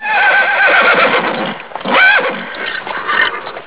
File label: horse